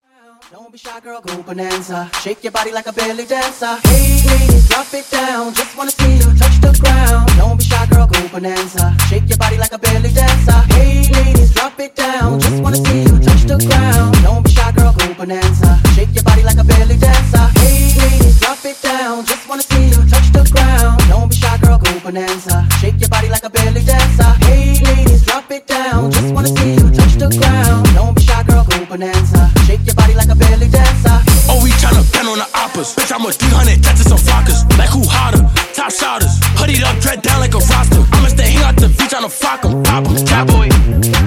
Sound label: Clappella Intro Outro 70 Bpm